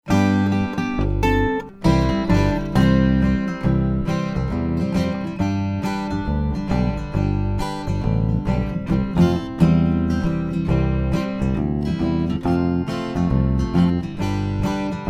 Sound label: Christmas backing